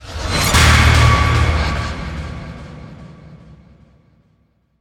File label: Sting; Void Bastards; Xonnox